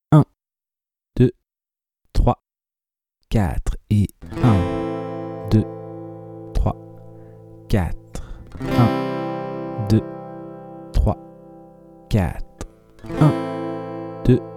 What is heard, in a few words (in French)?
2 lent Enchainement de Am à C; enchaîner en boucle Am et C. Changer d’accord sur les « 1 » et profiter des autres temps pour bouger les doigts.